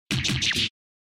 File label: Cut